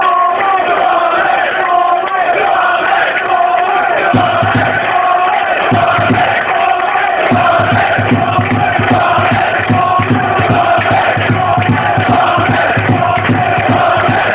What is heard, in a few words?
blue army, chants